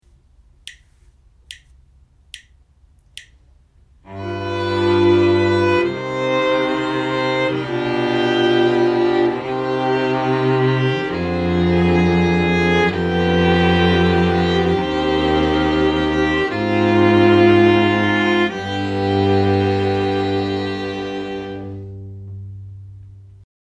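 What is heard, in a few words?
harmonic dictation, string quartet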